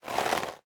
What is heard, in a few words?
armor; smite; chaac